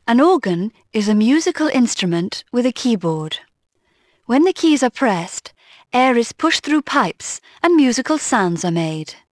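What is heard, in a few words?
organ; Dorling Kindersley My First Incredible Amazing Dictionary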